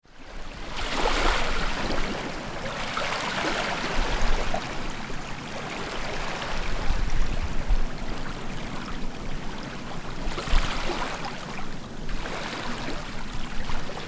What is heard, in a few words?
waves